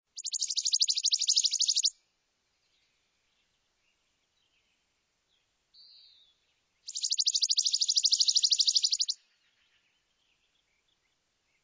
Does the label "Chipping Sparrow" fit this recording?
Yes